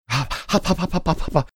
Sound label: making handling hot food sounds